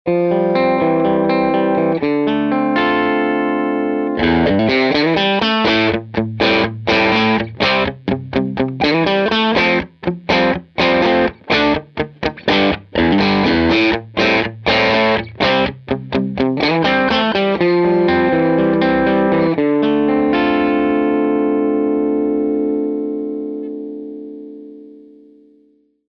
Rock N Roll Relics Thunders P90 Orange AD30 Combo Ch2